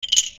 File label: clear bets, CasinoGames; Baccarat; TopDogLabs